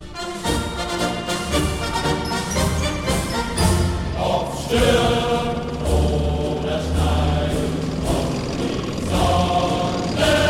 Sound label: Tiger Tank